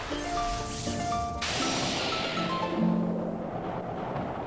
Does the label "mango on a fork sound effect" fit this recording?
No